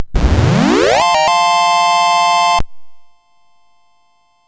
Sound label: Dragonworld sid MOS6581R4